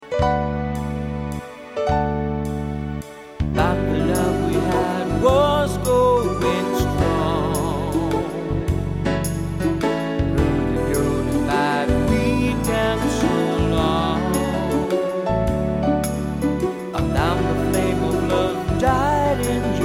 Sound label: Waltz